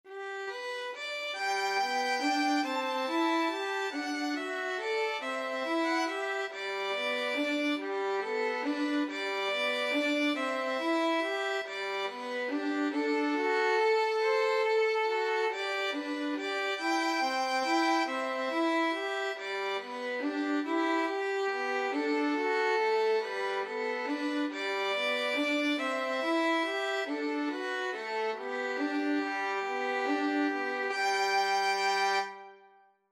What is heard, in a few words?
morning has broken violin duet